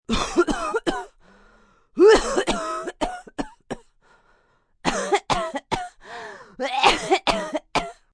Cough Sick Dry, Cough, female, sick, cold dry cough (human voice)